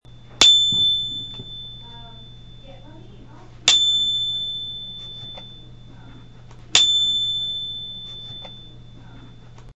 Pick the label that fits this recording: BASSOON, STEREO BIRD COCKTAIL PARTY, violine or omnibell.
omnibell